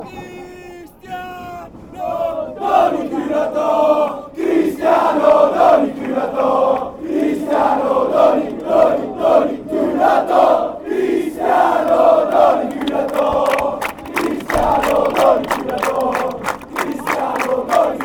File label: cristiano, fanchants